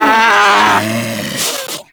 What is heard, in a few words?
Predator vocal death; Warframe; Hunting Critters; Characters